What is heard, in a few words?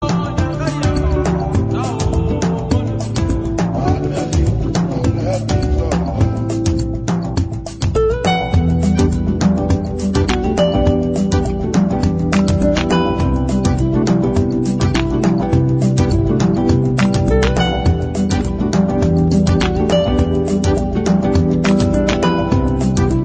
african village beautifull ringtone